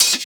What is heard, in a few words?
hat; Drum Sample Pack; One Shots; Hats; canopener; Slate; SEISMIC